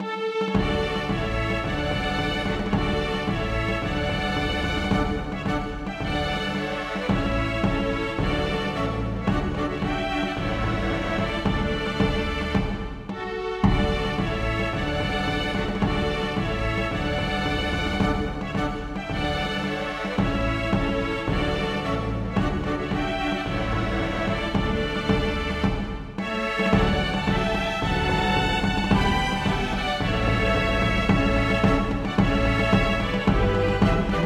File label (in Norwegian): Marsch for strykekvintett m. trommer; Hertz